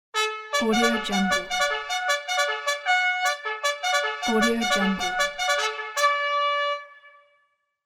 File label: cavalry trumpet